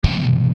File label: Hit Kick Drum, Hit Kick Drum Distortion Scrape; Sound Effects:Musical; Blastwave